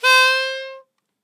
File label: Toy Sax C; ESW Sphere